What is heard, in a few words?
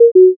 error